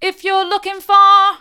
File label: mid, vocal